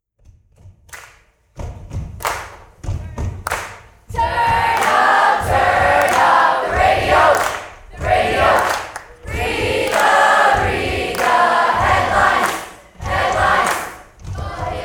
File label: Cheer; White Golden Age